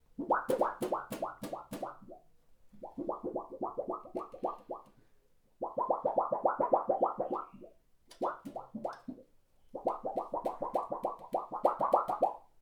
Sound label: Sheetmetal Wobble